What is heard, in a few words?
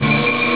energy